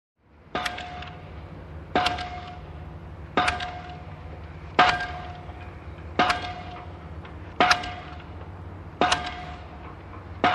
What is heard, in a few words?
Construction